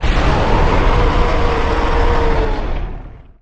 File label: roar; Barotrauma; Barotraumatic; Charybdissavage